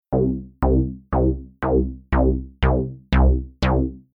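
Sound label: synth mod velocity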